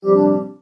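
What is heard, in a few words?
psn forward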